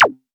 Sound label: Zap, Waldorf Blofeld